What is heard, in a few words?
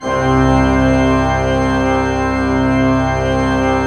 C2, Cathedral Organ; ILIO; Propeller Islands Cathedral Organ